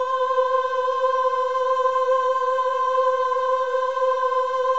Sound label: VIB M C5 -R, Symphony of Voices; Spectrasonics